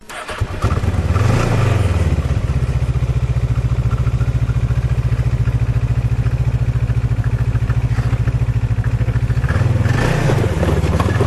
Snowmobile Ski-doo engine starts engine howls engine running drives away ignition; your free sounds